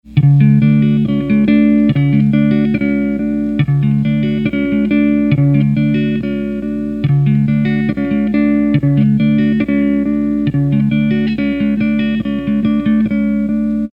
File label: Tap Swing, toggle